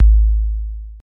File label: Kick Drum